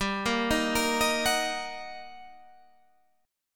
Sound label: G Minor Major 7th Sharp 5th, G, down, Guitar, Standard; GmM7#5 arpeggiated; JGuitar